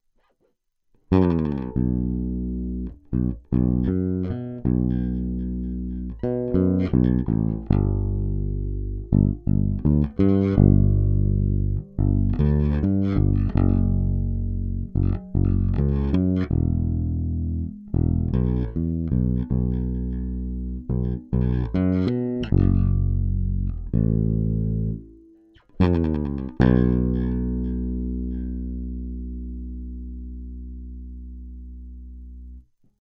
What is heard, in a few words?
bass di dry